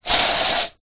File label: household aerosol spray insect killer spray